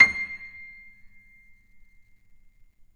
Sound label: PNO F; Synclavier Sampler Library